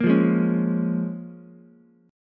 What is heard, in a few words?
Bb Minor 7th Ukulele, Bbm7 chord; Bbm7 strummed